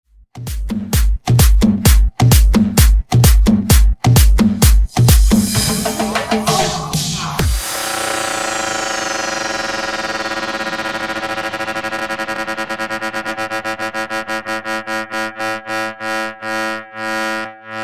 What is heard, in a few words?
Tribal Redrum; Darek Sotelo